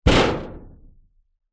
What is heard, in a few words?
impact metal door; zapsplat